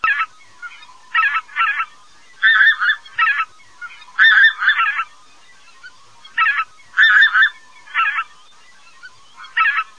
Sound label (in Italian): OCA LOMBARDELLA MINORE